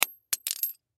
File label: Needle Drop; Hospital Sound Effects; Surgeon Surgery Four Inch Disposable Bone Marrow Biopsy Aspiration; Emergency